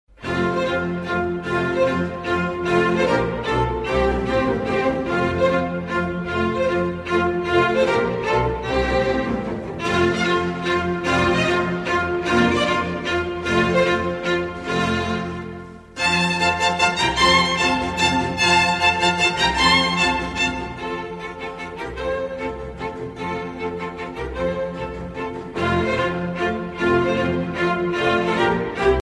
classic ringtone; antonio autumn

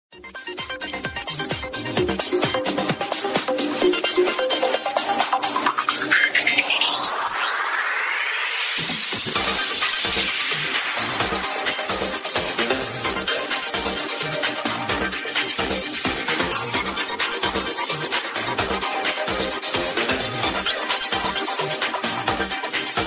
apple i phone 6 ride ringtone

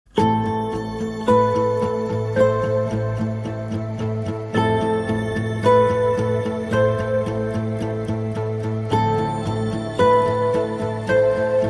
instrumental ringtone

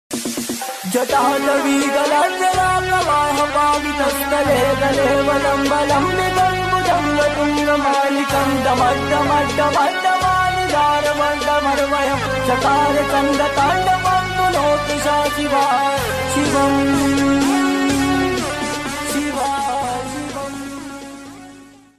shiv tandava sanskrit ringtone

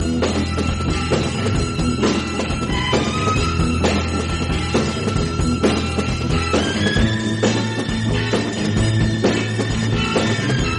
italian sequence tune ringtone

